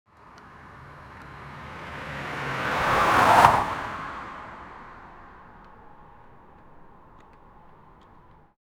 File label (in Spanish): un derrape de coche, tire